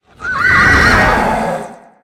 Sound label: chariot horse whinny; smite; Apollo